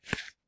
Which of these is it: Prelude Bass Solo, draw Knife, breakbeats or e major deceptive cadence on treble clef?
draw Knife